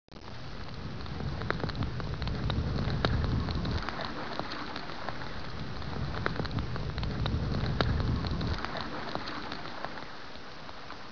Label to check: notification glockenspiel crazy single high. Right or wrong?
Wrong